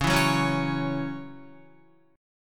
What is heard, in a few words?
C sharp Minor Double Flat 5th C sharp Guitar; C#mbb5; strummed; JGuitar